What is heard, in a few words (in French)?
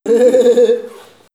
rire